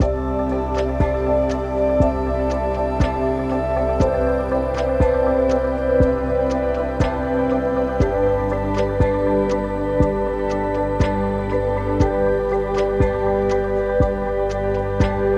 Ethereal; Ethereal Music Pack

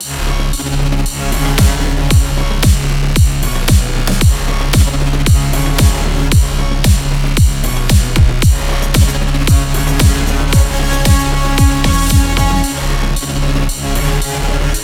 Heavy Electronic

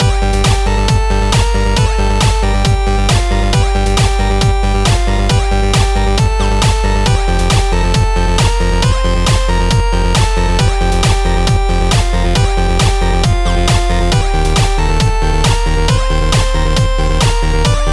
Chiptune; Chiptune Music Pack Vol. 4

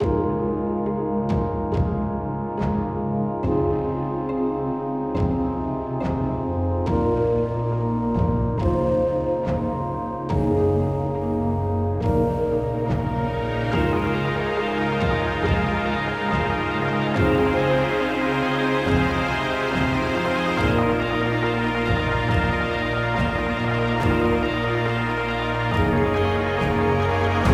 Synth Fantasy; Synth Fantasy Music Pack Vol. 2

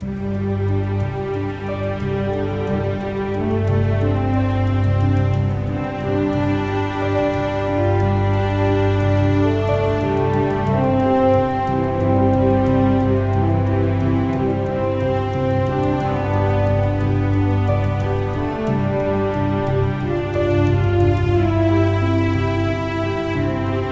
Orchestral Ambient; Orchestral Ambient Music Pack Vol. 2

